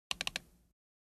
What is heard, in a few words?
click, mouse; マウスのクリック音・ダブルクリック; 生活系の効果音